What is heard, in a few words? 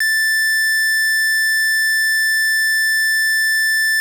square, 808, SubOsc; hiphop; StudioLinkedVST 808 Kontakt